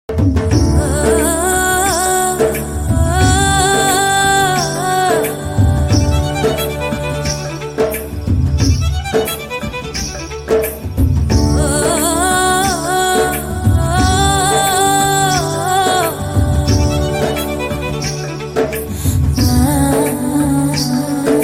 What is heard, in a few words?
Sad Bgm Ringtone; 7am Arivu